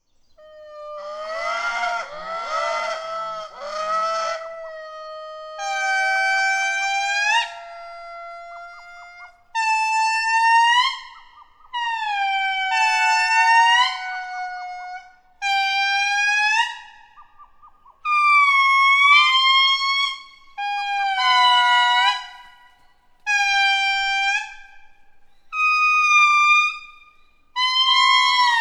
Indri; wilderness songs

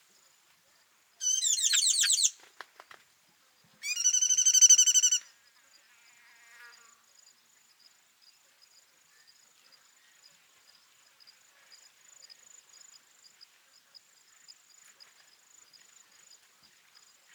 Tinkling Cisticola call; ZM Kawelwa; tropical birdsong

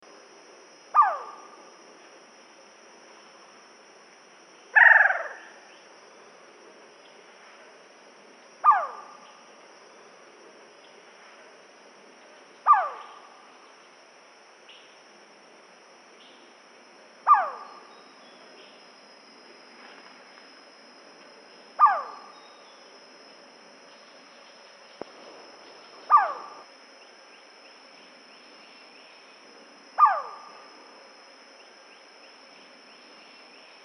Malayan Banded Pitta Hydrornis irena; tropical birdsong

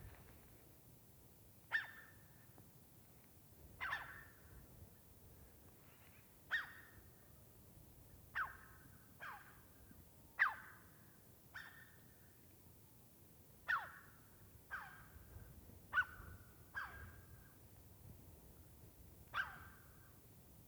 MOUNTAIN CROWS; wilderness songs

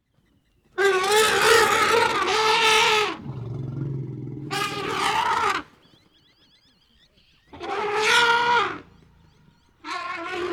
Elephant call and rumble; wilderness songs

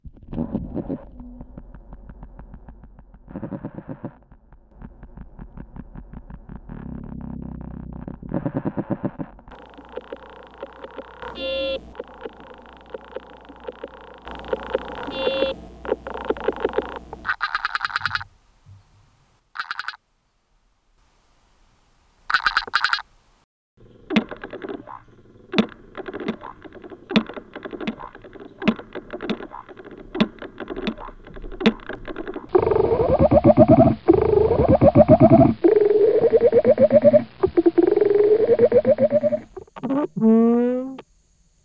TREEHOPPER MEDLEY; wilderness songs

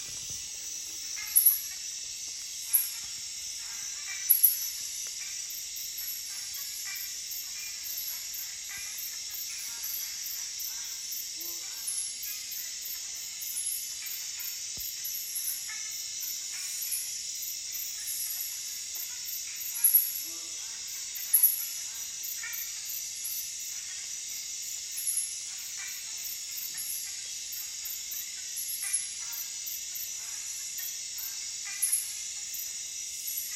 Tree frogs and cicadas night; wilderness songs

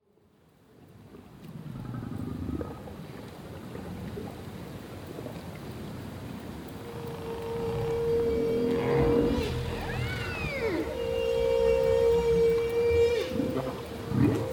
HUMPBACK WHALE SONG; wilderness songs

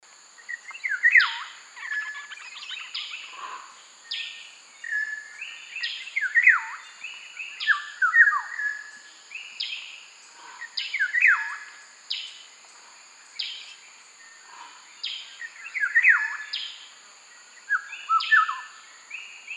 Black naped Oriole Oriolus chinensis mundus; tropical birdsong

